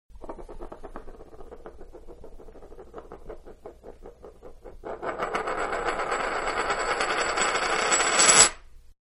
Half Dollar Spun On Wood Table, Coin; Money; Manhattan Production Music

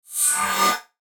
FLASH ELEMENT HIGH TECH

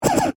Laser Gun Blast, Ray; Science, Fiction; Lasers; BlastWave

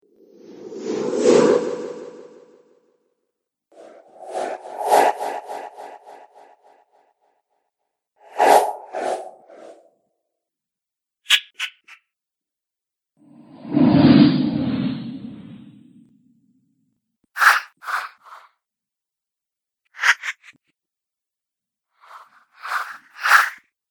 Strong Wind Whooshes; Whoosh Sound Effects

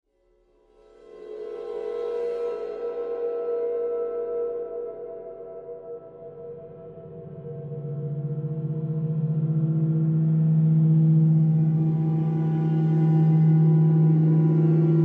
Horror Cymbal Swells Low Eerie; Scary Tones; Blastwave; Sound Effects:Horror

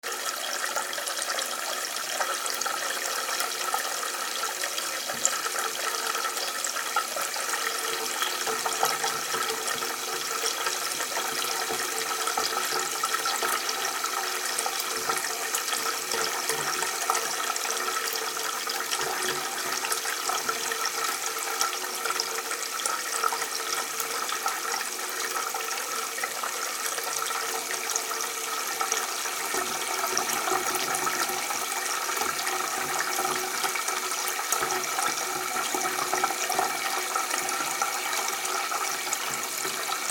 Water Filling Shallow Bath; Bathroom Sound Effects

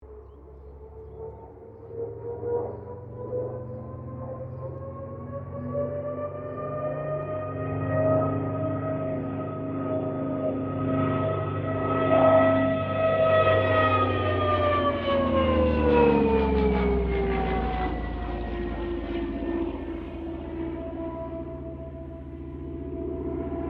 AIRCRAFT CA-13 BOOMERANG LOOP STEREO; Airplanes (Sound Effects:Vehicles)

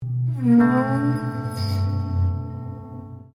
Piano Upright Broken String Slide Down Rubber; Upright piano without keyboard; Pianos; Musical; Piano Sound Effects

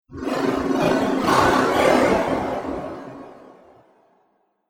Alien Laser Magic; Alien Sound Effects; Sci-Fi Sound Effect; Andrew Fleming

